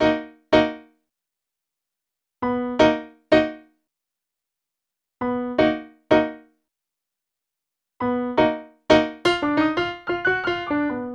Piano, cinematic funk

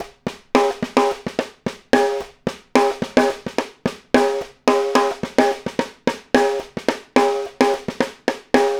Snare Pattern, Drums; Essential Percussion

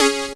SYNTH GENERAL; Megabass Remix! 2

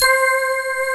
LINEAR SYNTH

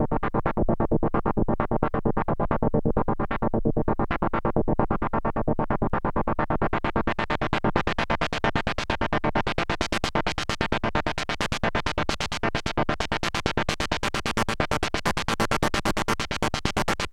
132, SYNTH SEQ; AMG; Global Trance Mission VOL-2

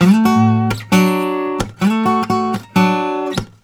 Blues Guitar; ILIO; Fretworks; LICK

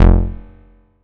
Bass, 2600 F1, Synths, ARP 2600

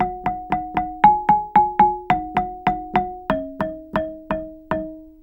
GAMBANG PH; Spectrasonics; Heart of Asia

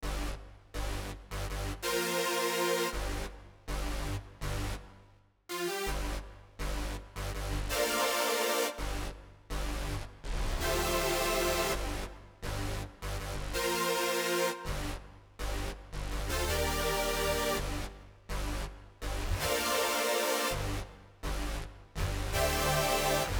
synth 1 C; Big Fish Audio; Legendary; Shes Mine

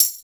TITE TAMB, TAMBOURIN

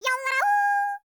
YODEL, World Voices

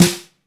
METAL, SNARE; BRASS

